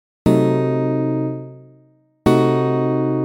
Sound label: diminish sound